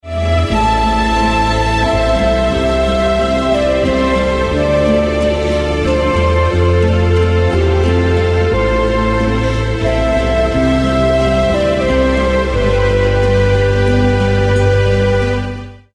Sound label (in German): melodie